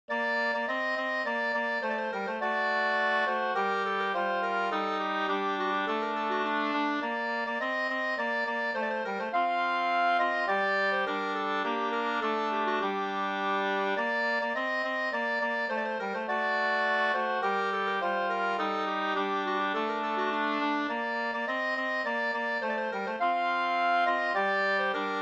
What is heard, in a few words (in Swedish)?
slangpolska